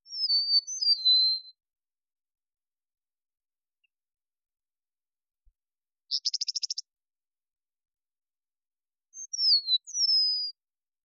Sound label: Eastern Meadowlark